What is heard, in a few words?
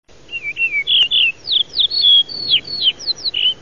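yellow-breasted bunting voice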